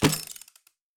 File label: Tether Shoot; DriftMine